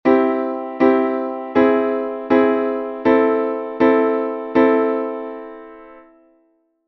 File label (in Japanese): 和音進行（C→Caug→AmonC）